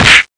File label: Attack